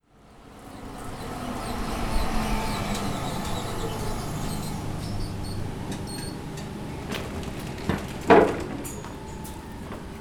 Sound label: tram